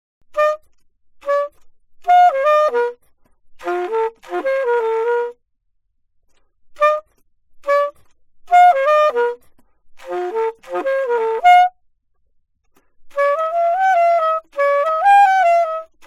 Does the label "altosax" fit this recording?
Yes